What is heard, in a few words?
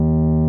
RHODES D#1; Sonic Reality - Vintage Timetraveler